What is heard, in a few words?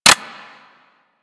M16 Rifle Mech End; Grineer